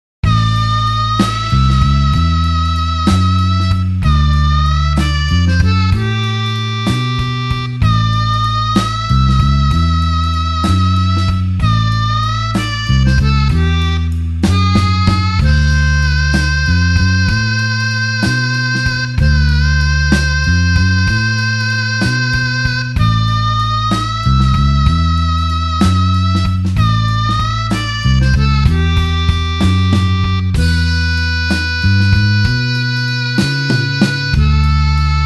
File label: blues harp slow A